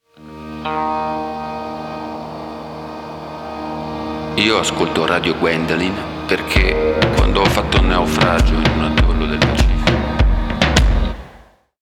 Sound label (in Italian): Jingle naufragio